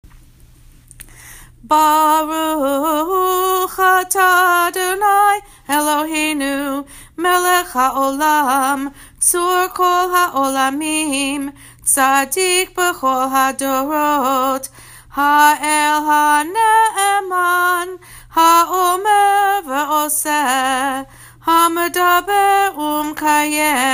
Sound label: bless after haftarah; torah musaf